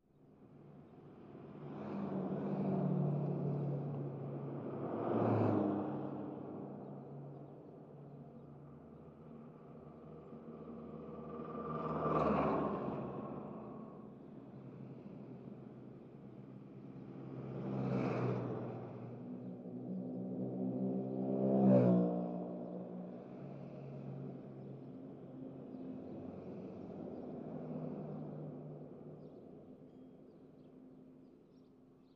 Traffic; Highway; Sparse Medium Perspective Highway Traffic.